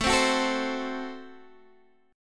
A Minor 6th, Guitar DADGAD

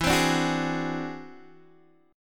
E Augmented 9th E down Guitar DADGAD

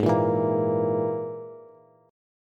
Ab Suspended 2nd Sharp 5th Ab Bass; strummed; JGuitar

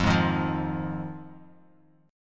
F Minor 7th; x,8,6,8,5,x,x,x; down; chord shape

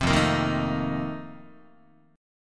F 11th F, down, Guitar; F11 strummed

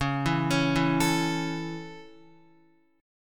C sharp Minor Sharp 5th down Guitar; C#m#5; arpeggiated; JGuitar

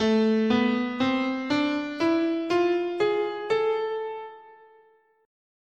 A Harmonic Minor, scale

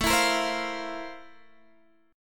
C 13th A; strummed

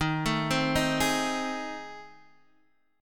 A Minor Major 7th Flat 5th Eb

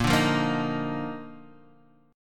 D sharp Major 7th Suspended 2nd A sharp

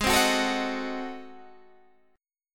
Ab 7th Flat 9th Guitar Standard, chord; Ab7b9 strummed; JGuitar

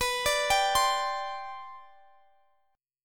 C Suspended 2nd, B, guitar chord; arpeggiated

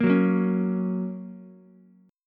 A sharp Minor Sharp 5th down Ukulele, A#m#5 chord; strummed; JGuitar

